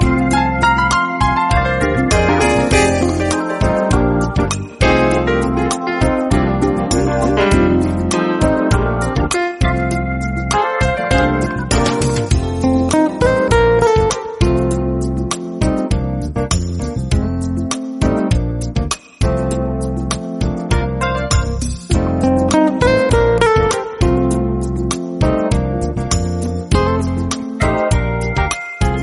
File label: smooth jazz